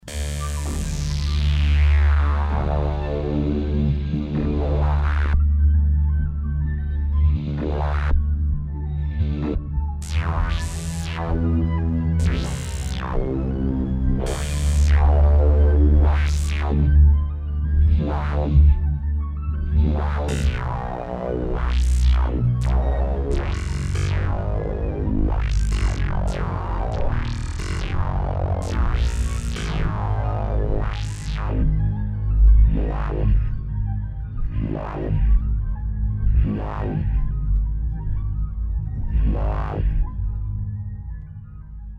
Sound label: synth TVF, Roland JV2080